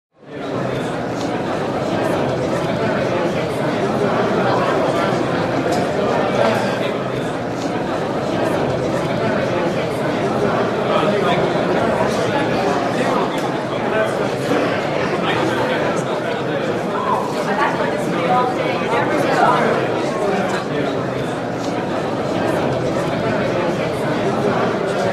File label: Interior Mixed Chatter